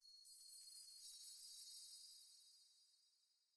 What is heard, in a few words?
fairy dust